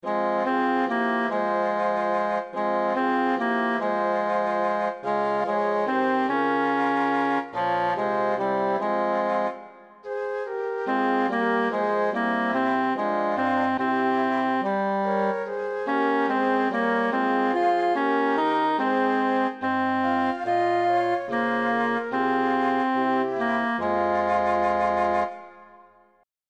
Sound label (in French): choeur; brahms rosmarin ten